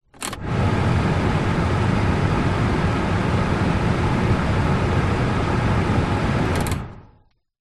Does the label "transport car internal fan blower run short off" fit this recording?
Yes